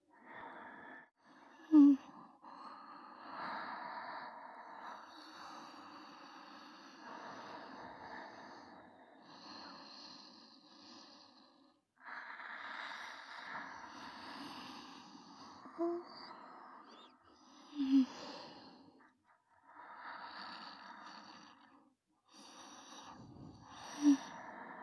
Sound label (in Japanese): 寝息ループ